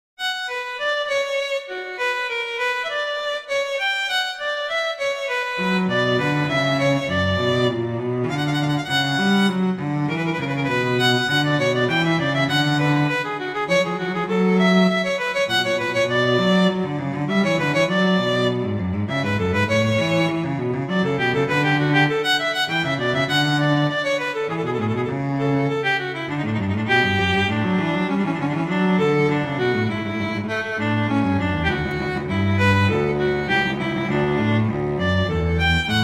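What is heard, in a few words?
Vivace moderato, Viola Cello; Telemann; Canonic; Sonata 4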